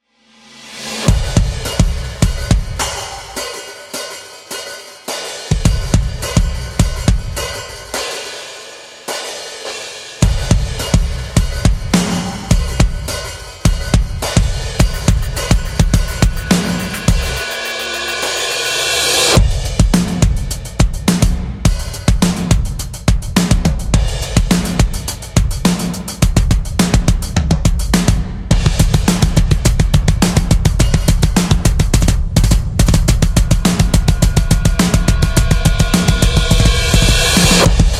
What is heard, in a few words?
Rock Grooves, Groove Playthrough; Damage; Heavyocity